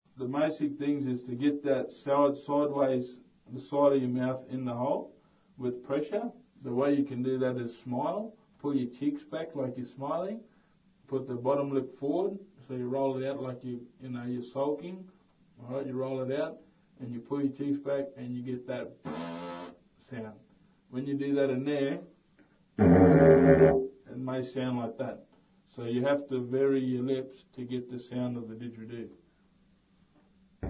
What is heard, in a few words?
Didgeridoo teach